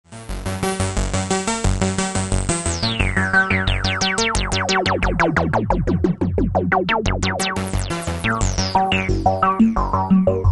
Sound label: filter